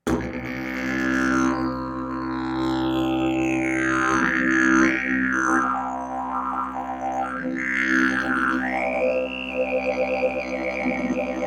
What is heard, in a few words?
Didge